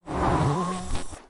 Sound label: Butler Blow Up